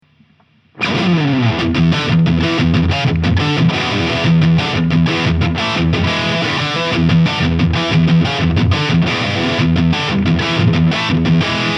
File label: Revv 100R Channel 2